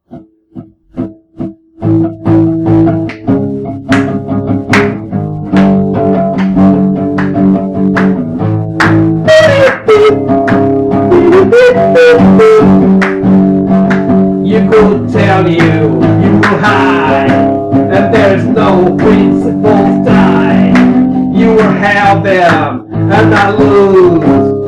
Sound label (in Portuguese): musica